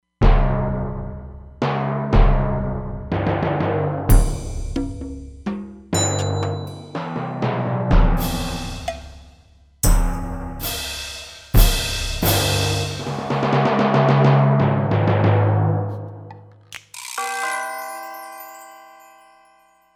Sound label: Orch Kit, Roland Fantom XR; Drums